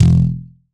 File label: Foley Spring Thick Spring